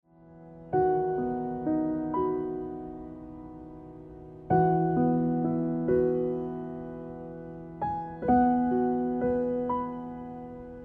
Ringtone

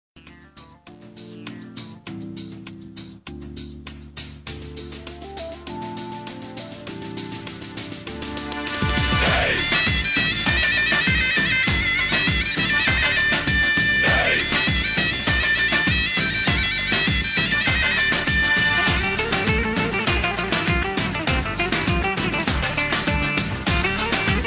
Beautiful Ringtone Turkey Ringtone

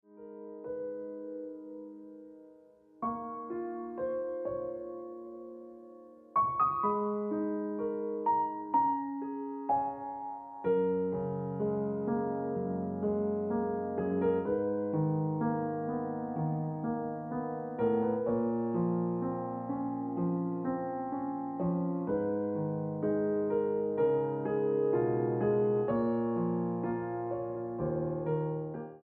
Piano Music

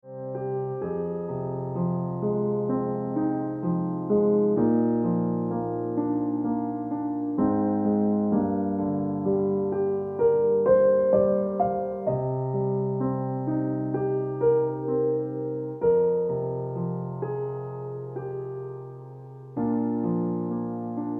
Ringtone

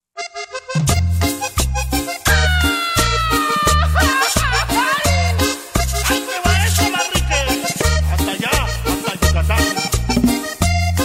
Mexican Ringtone

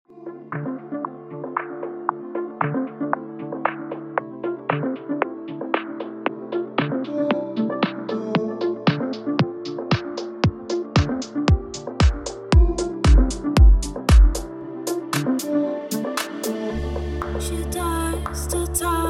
Ringtone

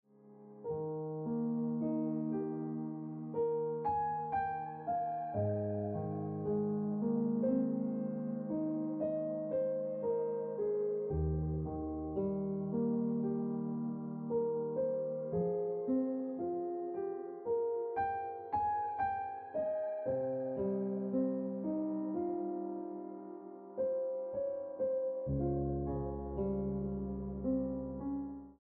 Ringtone Piano Music

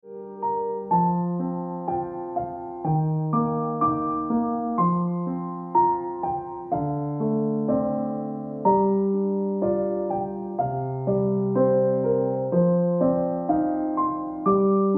Ringtone Piano Music

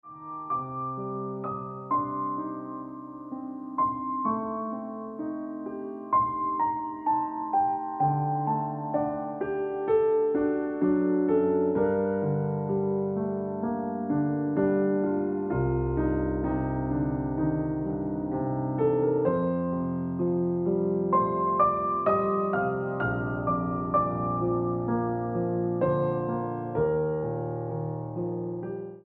Ringtone Piano Music

